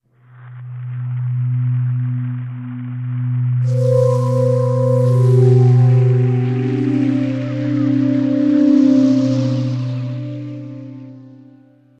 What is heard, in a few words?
Emotion